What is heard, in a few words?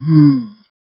a low weary moan from, zombie